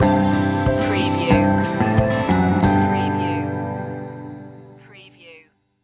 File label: whistle along stinger